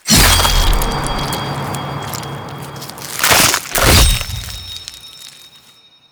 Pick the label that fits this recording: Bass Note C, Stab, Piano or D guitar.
Stab